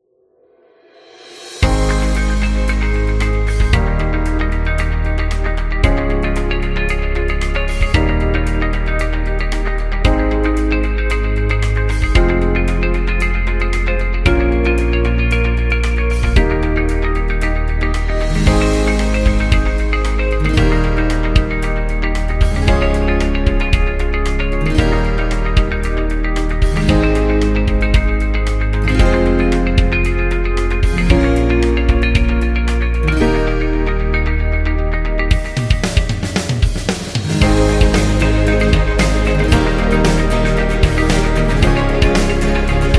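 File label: Healthcare, motivational corporate music; corporate music vol 21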